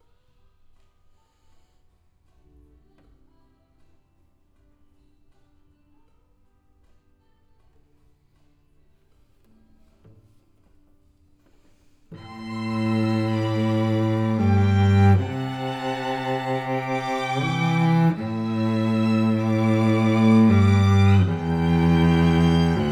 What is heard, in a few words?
Cello